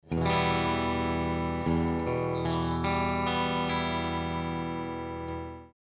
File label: Single Coil Bridge, stratocaster loaded pickguard